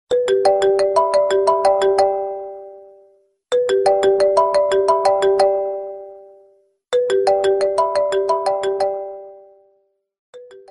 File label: samsung orignal ringtone; galaxy ringtones